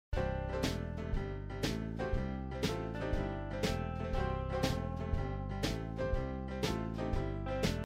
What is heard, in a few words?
bgm